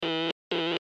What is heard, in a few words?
Morse Code M Letter; Blastwave